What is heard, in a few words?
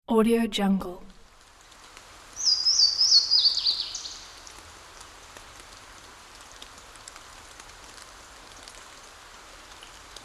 light rain in forest; alexleadsounds